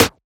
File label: Percussion; FLPRO